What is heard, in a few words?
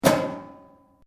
METAL BOX; Metal (Sound Effects:Materials)